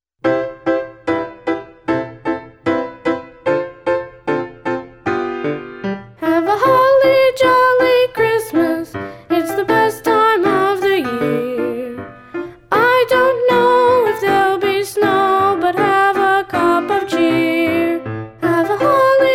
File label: vox